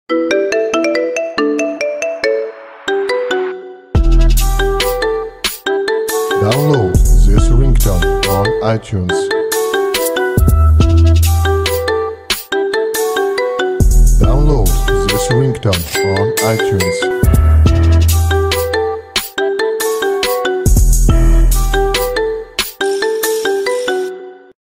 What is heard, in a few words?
Money Marimba